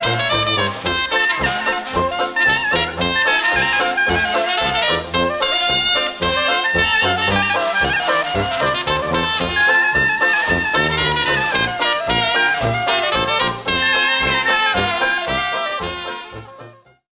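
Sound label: cornet jazz; Musical Instruments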